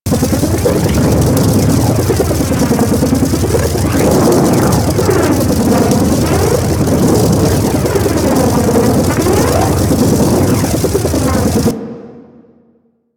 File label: Universe Ring Charged Loop; Warframe; Warframes; Nezha